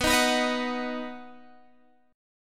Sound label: B Major B down Guitar Standard; strummed; JGuitar